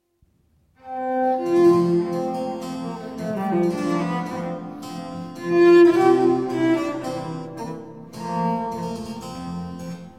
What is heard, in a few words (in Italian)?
Sinfonia In Fa maggiore Comodo, per Violoncello e Basso Continuo; Giovanni Battista Pergolesi; Vito Paternoster; Sinfonie and Sonate del settecento italiano per Violoncello e Basso Continuo